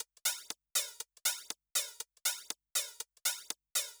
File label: House Drum OnlyHH Flanger